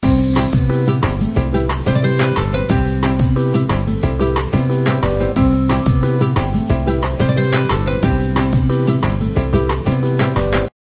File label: ringer; Ringtones